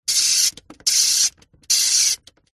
household radiator heater portable timer dial turn set sequence